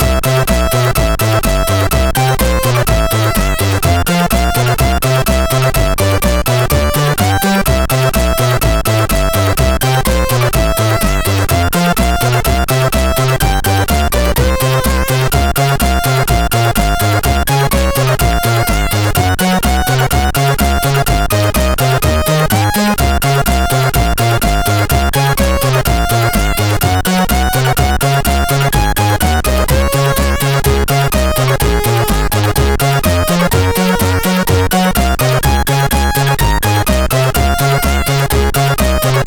X-Mas Song, sid CSG8580R5; hvsc

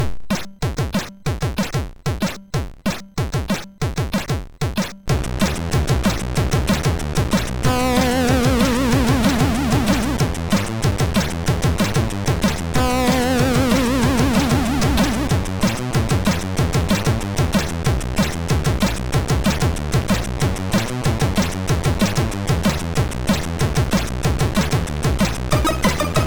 Rock, sid, MOS6581

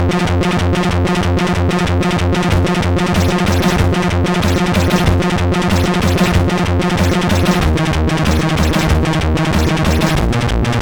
sid MOS 6581R4; Speedy Pat

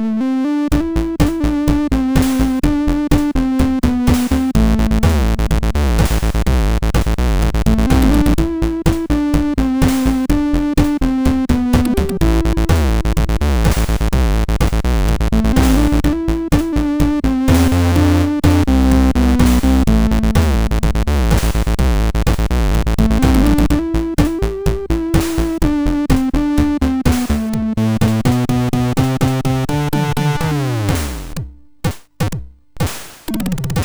Storm, sid MOS6581R4

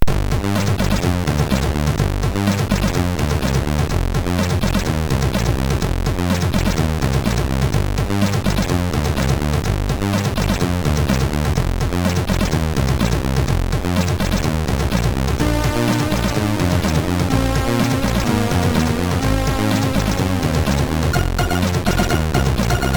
Introtune, sid MOS6581R3; FBR

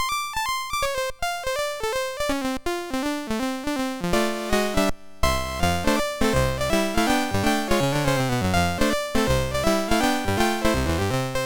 Racket, MOS6581R2

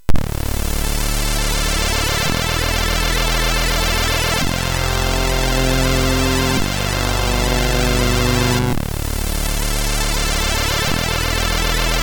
Snare MOS6581R3

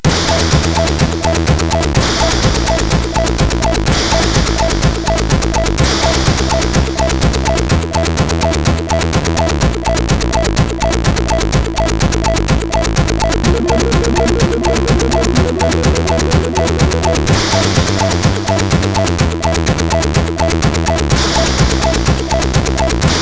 sid CSG8580; HVSC; Elf Caper

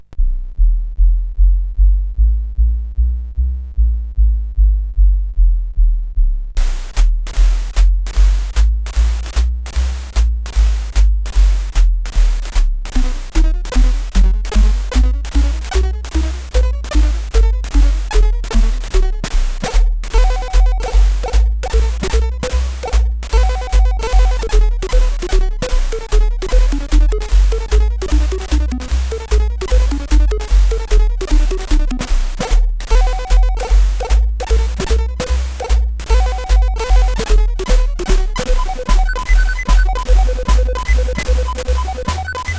Jam, CSG8580R5

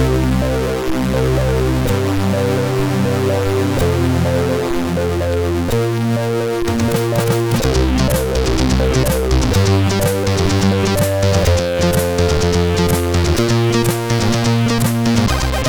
CSG8580; Armageddon Intro

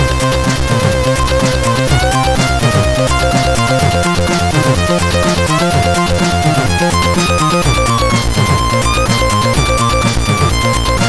Intro Song, CSG8580R5; Ikari

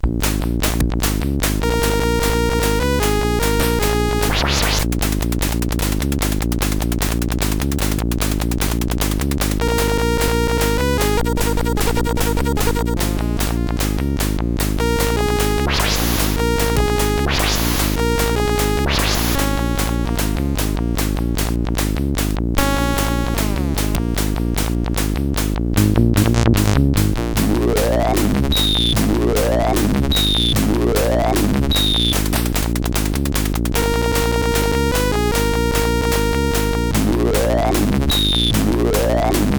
Techno Mix, sid CSG8580R5; hvsc

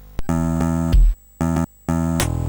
CSG 8580R5; Bullet in the Head

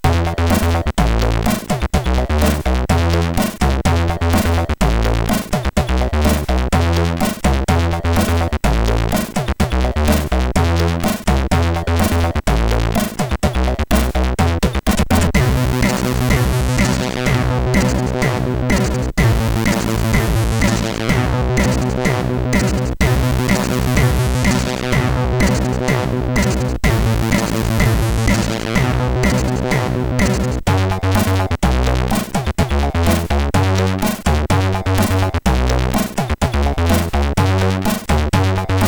part 2, MOS6581R3

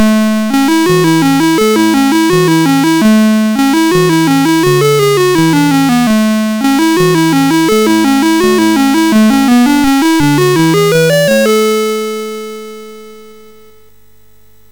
sid MOS6581R3, GAMES; Alice In Wonderland

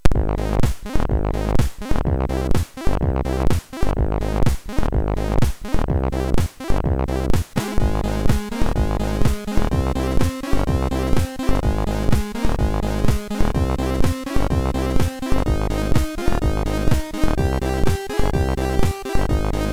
Tractor, MOS6581R3

